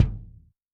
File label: Maple, Kick, Yamaha DTXpress-II